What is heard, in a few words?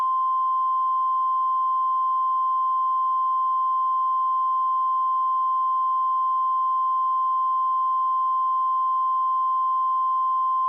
20dBFs, 1kHz; BAARIA